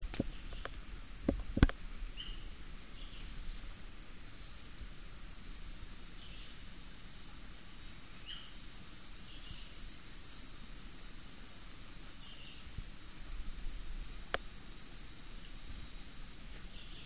Minute hermit, پرنده; گوشهگیر ریزه

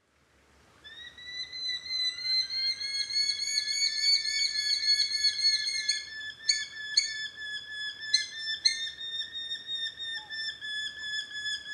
Eurasian hobby

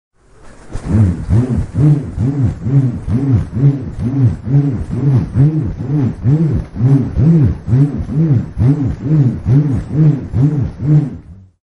Bullroarer

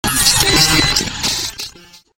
SciFi Glitch

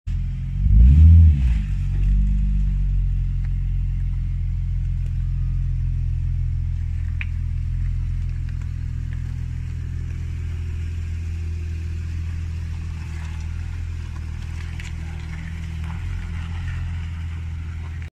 Fownix FX

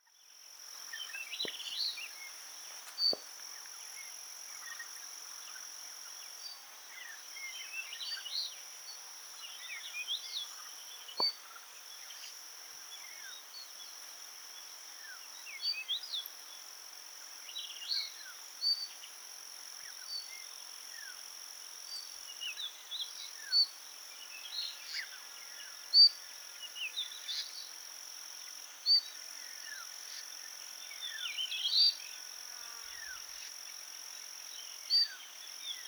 Jerdon's bush chat

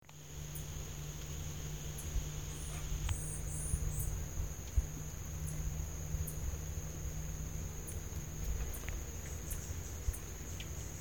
Zapata sparrow

